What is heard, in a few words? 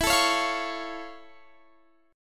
F Augmented Major 7th E